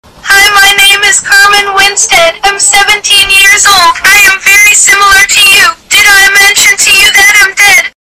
spooky and scary email

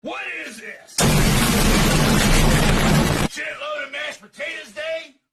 earth shattering pan drop

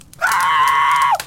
pop scream; htf

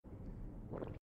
blood bag sound; deceit

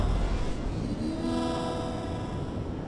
failed mission; watch dogs

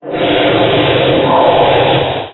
roar distant; great wroggi

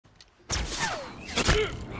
arrow strike

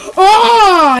oooooohhhhhh

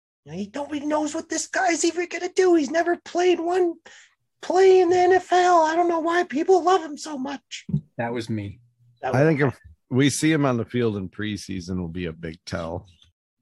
whining

